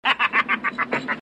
laughter

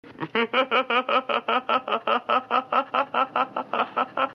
laughter

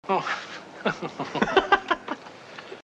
laughter

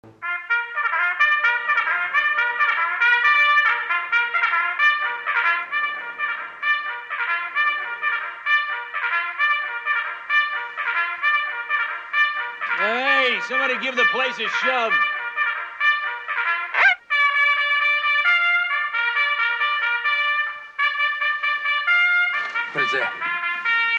bugle

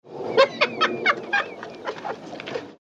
laughter

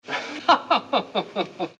laughter

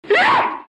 sneezing

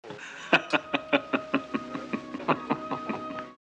laughter

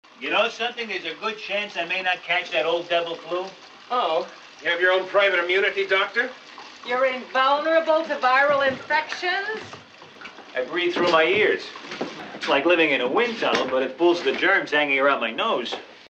wind tunnel

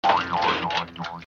boing

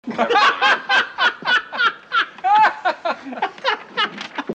laughter

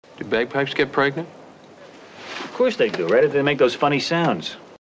bagpipes

